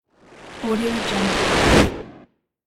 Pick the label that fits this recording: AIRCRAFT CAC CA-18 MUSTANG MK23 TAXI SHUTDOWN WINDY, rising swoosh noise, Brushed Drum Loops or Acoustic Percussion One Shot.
rising swoosh noise